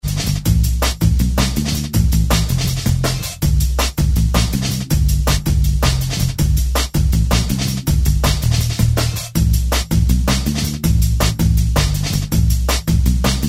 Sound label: tropical jungle dance